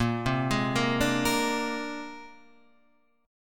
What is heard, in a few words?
C 7th Suspended 2nd Sharp 5th A sharp